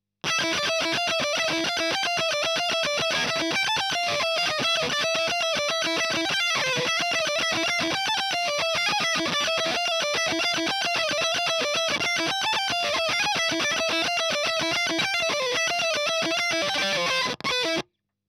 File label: EL Fuzz End